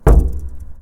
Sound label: Bump